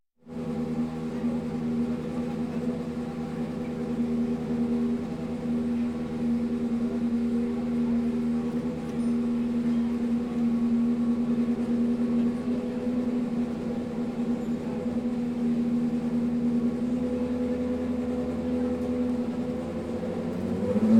Car Race General Atmosphere; KMP Film Video Sound Effects

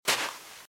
Footsteps - Sand - Dry - Walk - Right

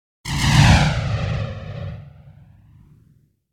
Whoosh Firing Phased; Hollywood Edge; sound designer's toolkit v2

